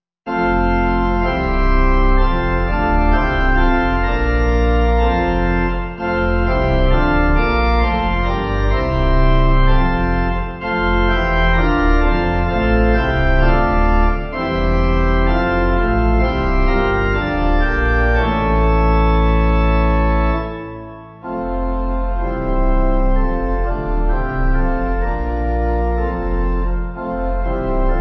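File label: Pipe, Pipe Organ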